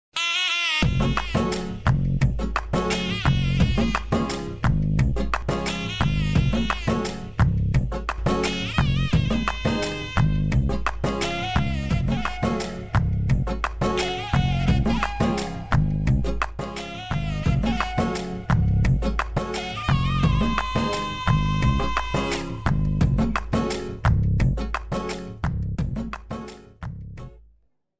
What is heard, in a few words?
love bgm ringtone; viswaasam; thookudurai